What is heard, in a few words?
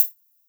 HH, Dance Drums